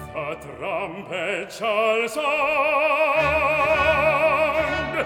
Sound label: trumpet voice